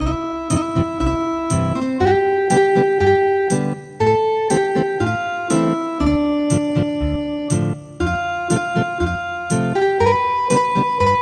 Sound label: Ethnic Guitar